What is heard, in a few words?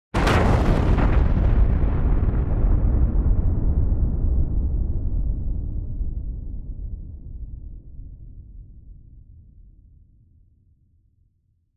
thud